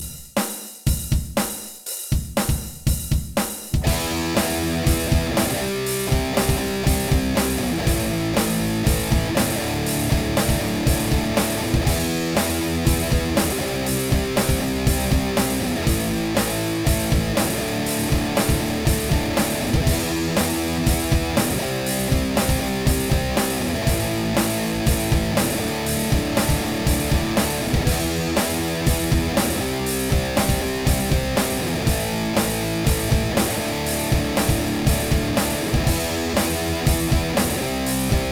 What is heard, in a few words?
Playback slide hammer pull off